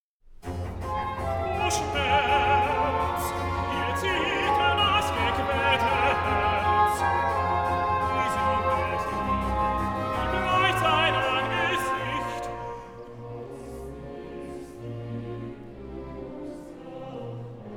Recitativo tenor; Baroque; J.S. Bach; St. Matthew Passion; BWV 244, Part I; O Schmerz. Hier Zittert das gequälte Herz

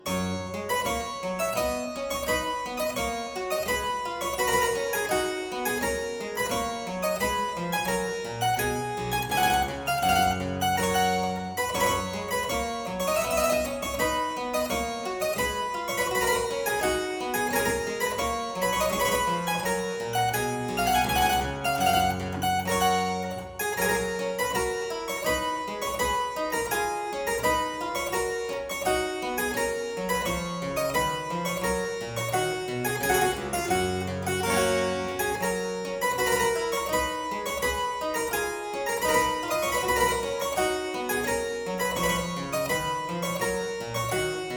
Aria in G major Partita 23, Harpsichord

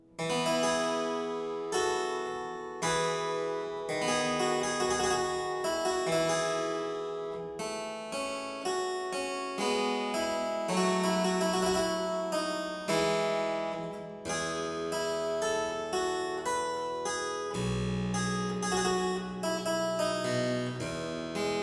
Suite in F major Sarabande, Harpsichord; Buxtehude; Complete Harpsichord Music; BuxWV239

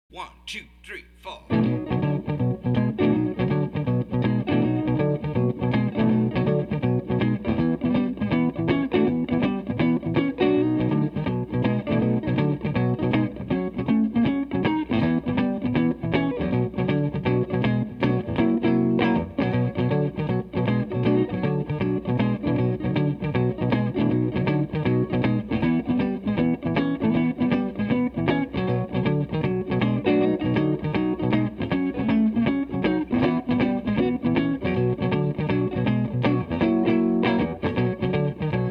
B Shuffle, harmonica; Blues Harmonica Masterclass

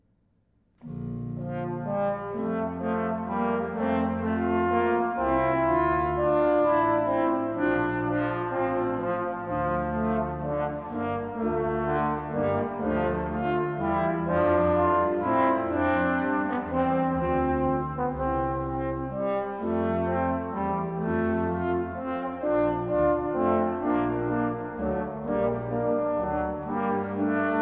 Canon a due. Largo (2 trombones, organ, violin); BAROQUE; Fux; Requiem, 'Missa Pro Defunctis'

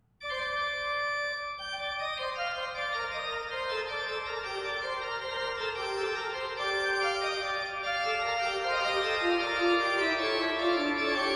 Canzona in G minor, Organ; Buxtehude; Complete Organ Music

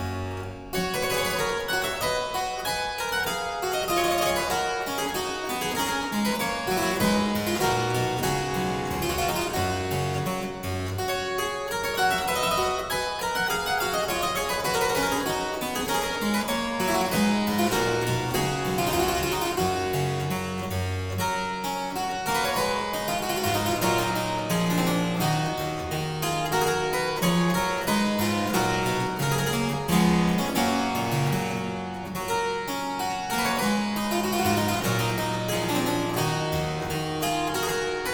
Aria Variations in G Major, Harpsichord

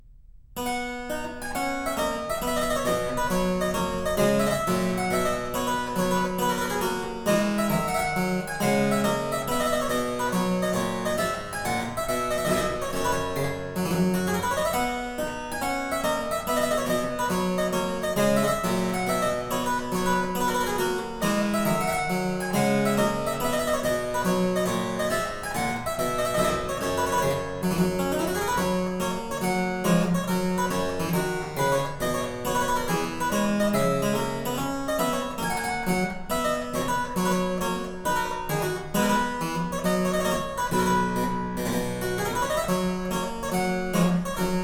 March In С Major, Harpsichord; Purcell edition

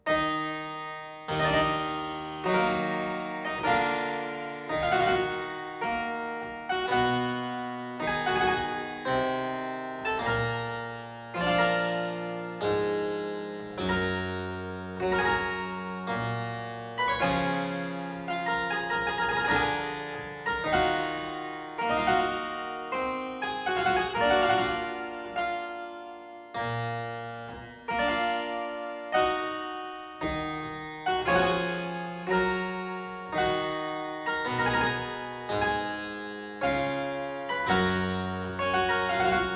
E minor Sarabande, Harpsichord; Buxtehude; Complete Harpsichord Music; Auf meinen lieben Gott; BuxWV179

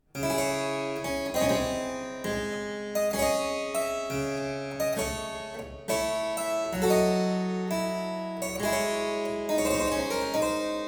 Suite in D minor Sarabande II, Harpsichord; Buxtehude; Complete Harpsichord Music

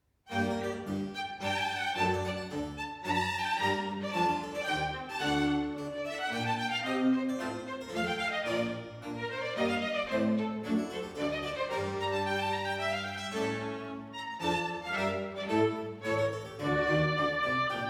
Oboe Concerto in G minor - Allegro; Handel; Oboe Concerto No. 3; HWV 287

